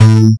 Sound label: Rez Bass A One Shot; Drum & Bass